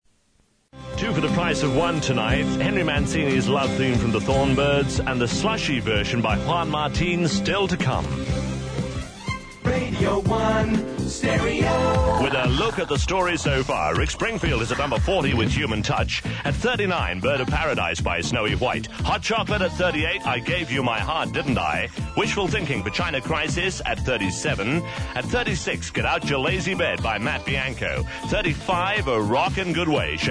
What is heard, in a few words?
top 40 jingle